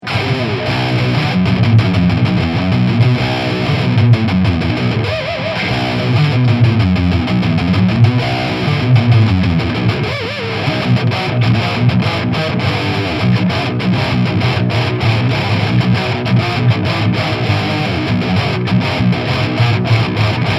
Hugh Gain Bridge PU Voicing1

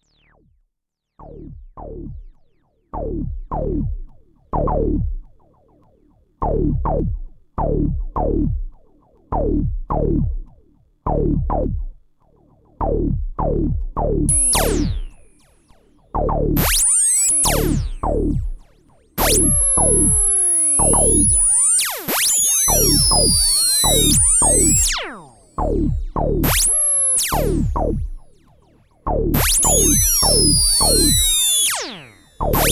percuss Res

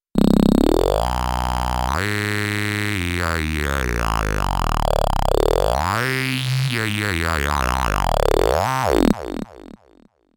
LoFi

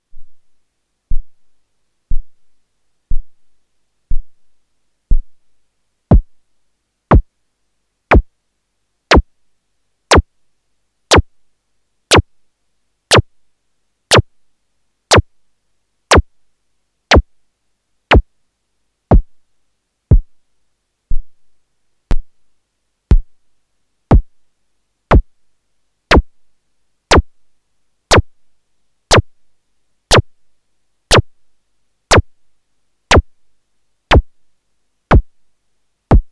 Fold Drum; Erica Synths Perkons HD-01

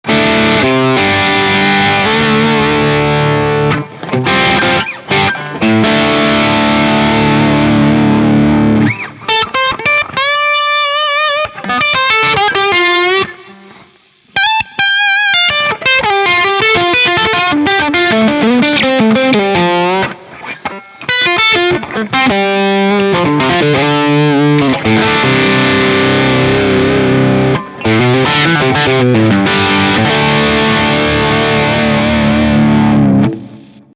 Friedman JJ Junior full gain

